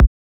Kick, techno drum

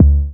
Jomox Kick

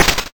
Snare, YM, CHIPSHOP HITS DRUMS; ChipShop Deluxe

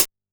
DnB Drum Hit Hat; drumnbass 170bpm; Drum Kits; musicradar; Drum Hits B

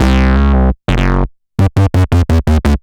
Bass A dist 170 C, drum n bass 170bpm; musicradar; Basslines A

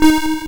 YM Uni Synth; SFX; CHIPSHOP DELUXE; CHIPSHOP HITS

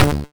YM Kick, DRUMS

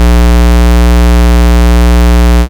YM Bass A#, chip; CHIPSHOP HITS

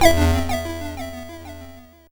YM Arp E; chip hits; arps; CHIPSHOP DELUXE

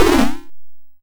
YM Ill; SFX; ChipShop Deluxe; ChipShop Hits

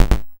YM Perc, DRUMS; ChipShop Deluxe; ChipShop Hits

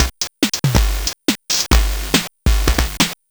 GB Drums, 140 BPM; loops; CHIPSHOP DELUXE

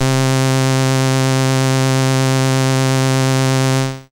SID Bass C; CHIPSHOP HITS

